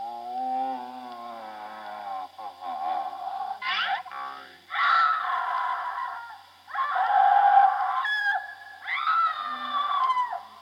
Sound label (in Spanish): susto y grito